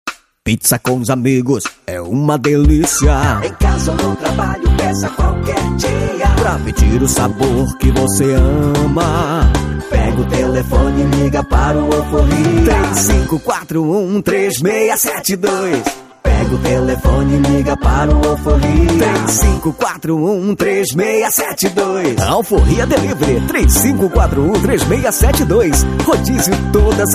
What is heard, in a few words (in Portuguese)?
jingle pizzaria